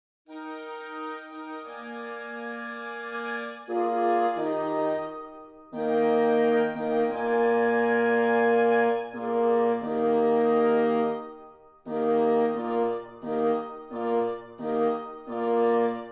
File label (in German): Andante Fur Blasende Instrumente; Kafka; Ludwig van Beethoven